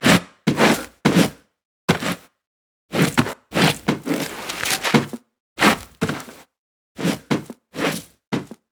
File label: emote dance; LoL; shyvana